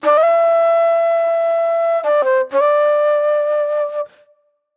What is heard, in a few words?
Pop Flute; Sonic Foundry (Sony Creative Software); Manhattan Flutes; Slinky's Place